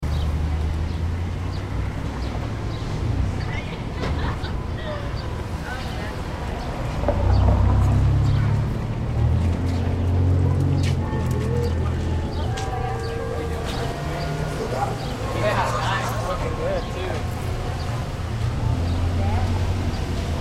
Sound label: main street traffic; huntington beach